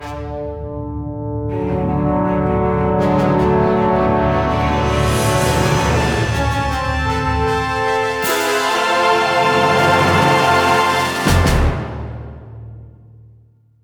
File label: Cinematic Zombie Win Generic